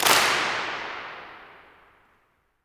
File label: CLAP, MONDO CLAP